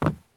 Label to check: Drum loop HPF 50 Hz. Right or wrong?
Wrong